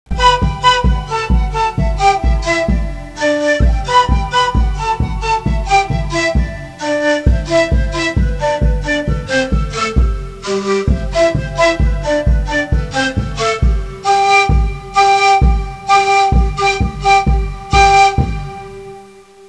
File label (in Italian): musica strumentale